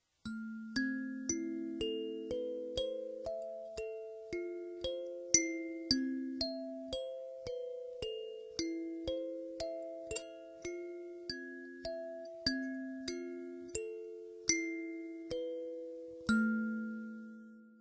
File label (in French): SANZA 9 LAMES